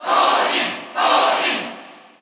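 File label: Cheer Russian, crowd; Corrin; SSBU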